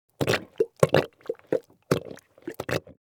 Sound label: Plumber working plunger suction